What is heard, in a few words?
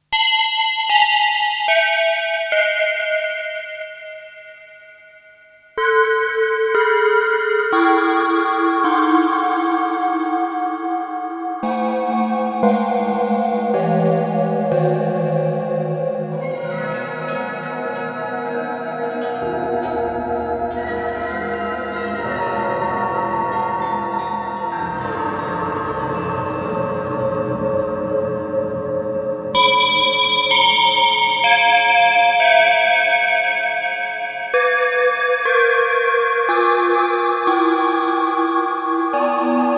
Alesis Midiverb 2 Bloom 2 7 Sec